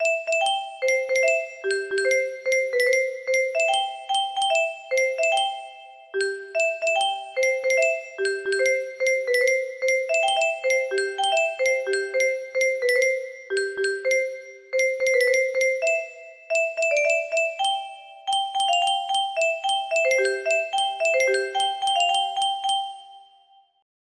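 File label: music box melody; to the colors bugle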